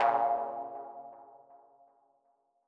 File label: Mono DBeat A#2; KG MegaSaur